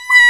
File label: Trpts